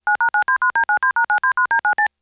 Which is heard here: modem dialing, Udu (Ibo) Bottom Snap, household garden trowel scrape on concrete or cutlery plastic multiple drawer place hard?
modem dialing